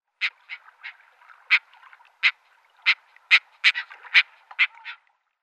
Raubseeschwalbe Sterna caspia

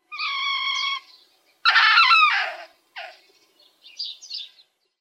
Baummarder Martes martes; Tierstimmen

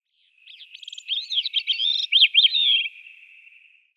Grauwangendrossel Catharus minimus; Vogelstimmen

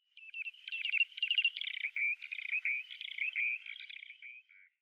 Kleiner Schlammlaeufer Limnodromus griseus; Vogelstimmen

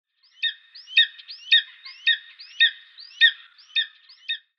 Schelladler Aquila clanga; Vogelstimmen

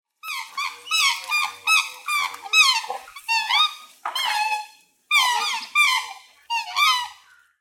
Trauerschwan Cygnus atratus; Vogelstimmen

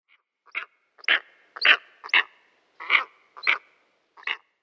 Steppenadler Aquila nipalensis; Vogelstimmen

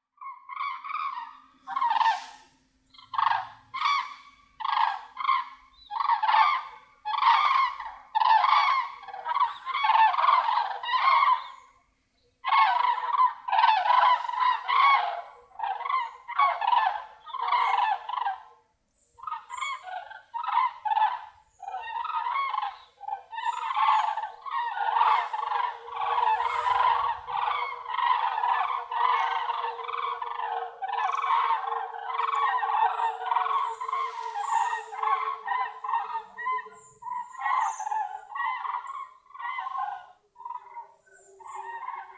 Kranich Grus grus, Ostsee; Vogelstimmen

